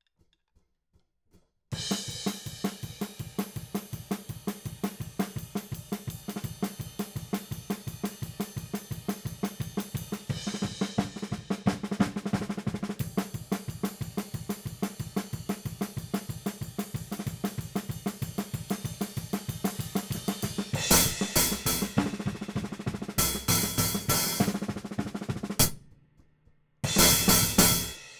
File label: Hat